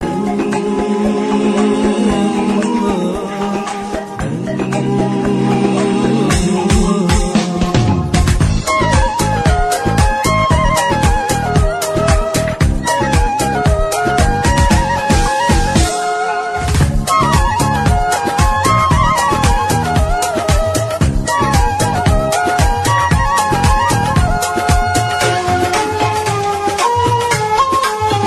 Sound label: islamic ringtone